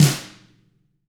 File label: tama flam; ILIO; Double Platinum Drums 1